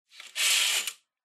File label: Curtain